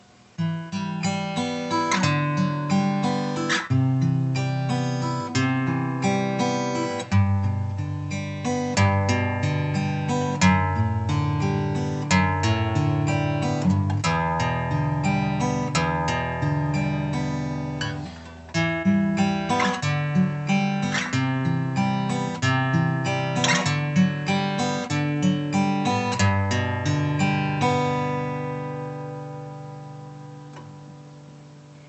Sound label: OM fingerstyle